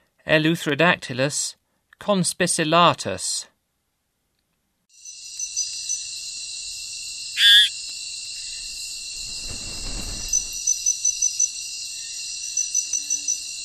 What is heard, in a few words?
Eleutherodactylus conspicillatus, Frogs of Ecuadorian Amazon; S. America